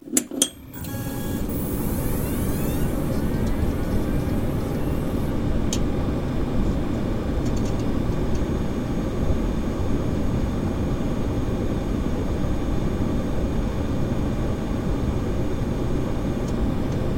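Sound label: sounds of computer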